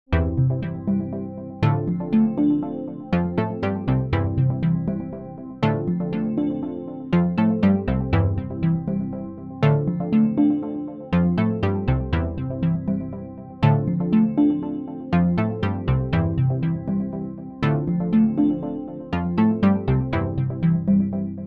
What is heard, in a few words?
Chord Bassline Loop